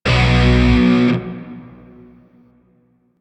Shawzin Chromatic Chord L; Grineer; DE; Warframe

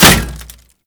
Bullet Impact Metal No Whizz; BulletImpacts; DE; Warframe

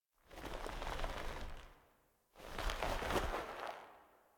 Foley Squirm; Cinematics; Warframe; Orbiter Cradle

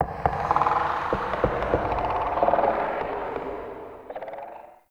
Root Cave Trippy Knock; Ambience; One Offs; Neathuns Root Cave

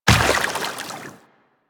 Step Water, Footsteps Walk; DE; Warframe; Tenno

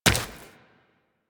Run Flesh, Footsteps; Warframe; Tenno

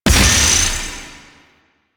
Archer Disengage Ability Explode D; Warframe; Duviri; Duviri Dax; Abilities

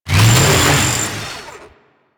Saw Melee Swing Long; Weapons; Warframe; Grn Ghoul Saw Player

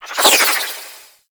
Arch Wing Prime Dodge Roll Left; Movement; Warframe; Vehicles

